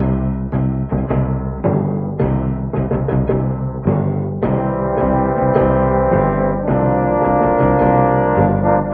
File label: strings triumphant, Loops; Phonky